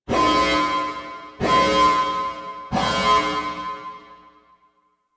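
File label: Ship Breach Alarm; Hazards; Rail Jack; Warframe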